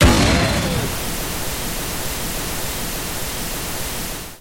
rocket flare; Dota 2; Clockwerk

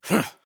Grunt Jump; Paladins; Dredge

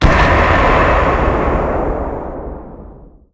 Dark Rift teleport

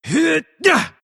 attack big; pangolin

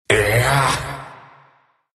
grunts

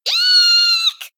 Low

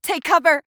Mage Taking Damage; Realm Royale

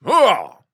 Grunt Attack; Paladins; Astro

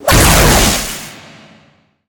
attack crit; Razor

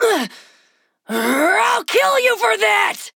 Taking Damage; Flak

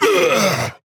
Grunt Hit; Drogoz Dreadhunter

